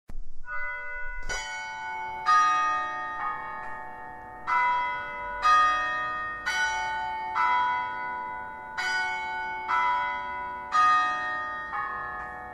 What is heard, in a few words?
angelus bells and noon peal